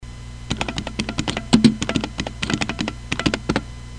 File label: model m sound, keyboard